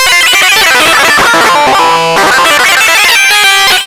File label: DISTLOOP, Big Beat Samples; DISTLOOP KIT; AKAI